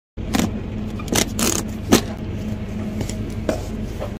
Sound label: paper cup